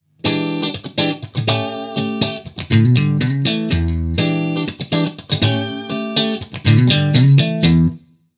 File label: Funk, ringtones; Marshall; London KB 1501